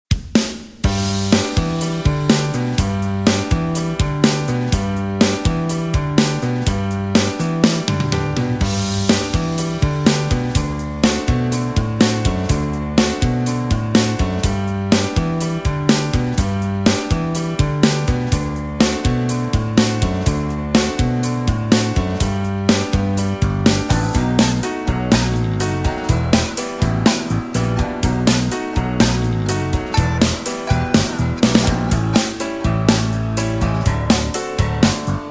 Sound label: G No Backing Vocals, backing tracks